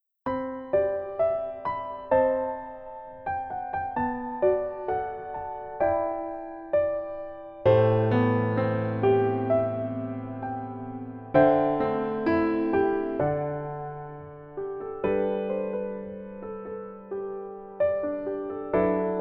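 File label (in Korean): Piano version 멜로디 MR 여자키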